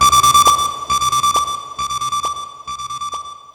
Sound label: Acid Echo; Sonic Foundry (Sony Creative Software); Ugly Remnants VOL-2